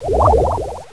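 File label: WATER LASER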